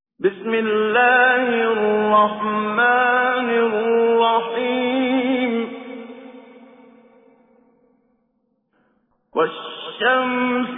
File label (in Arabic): سورة الشمس, تجويد; المقريء الشيخ محمد صديق المنشاوي